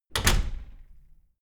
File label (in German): close heavy, Türen; objekte; tueren